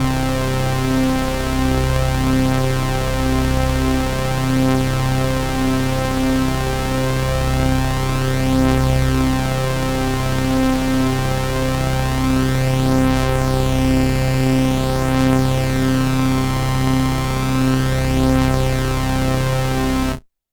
BIG OCT, Synths

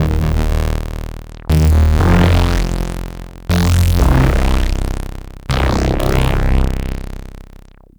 Nasty Bass, Bass Foundations; Sonic Foundry (Sony Creative Software); The Electronic Music Manuscript Collection

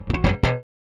Rickenbacker Bass; Airwindows; Rick-Xtras

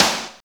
SNR, Tekno Industrial; East West

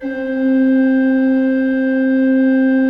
C3, Cathedral Organ, ROHRFLOETE; Propeller Island

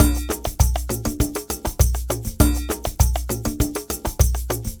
Ensemble, Latin Percussion; Sonic Foundry (Sony Creative Software)

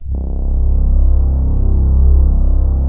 MKS PAD C2; Q Up Arts; Dream Experience

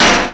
Foley Mid, Drum Bits, Sweeteners

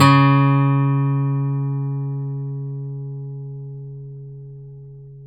STEEL F C#2, GUITARS; Synclavier Sampler Library

